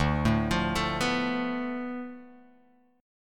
D Minor 6th Guitar Open F; Dm6 arpeggiated; JGuitar

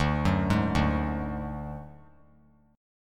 D Major Flat 5th D, chord; arpeggiated; JGuitar

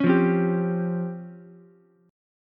Db Major Sharp 11th Db down Ukulele C6; strummed; chord shape; JGuitar

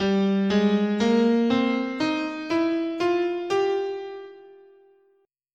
G Symmetrical, scale

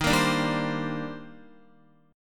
Eb Major 13th Eb down Guitar; EbM13; strummed; JGuitar

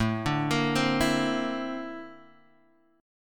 A sharp Major Sharp 11th, chord

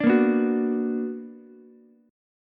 B Minor Major 7th, Ukulele; strummed; JGuitar

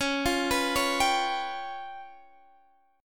Db 7th Flat 5th, Guitar, Standard; Db7b5 arpeggiated; JGuitar

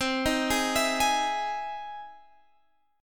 E Augmented Major 7th C

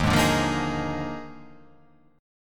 Eb Major 7th Suspended 2nd Suspended 4th Eb; strummed; EbM7sus2sus4

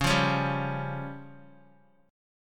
C sharp Major Flat 5th, Guitar; C#Mb5 strummed; JGuitar

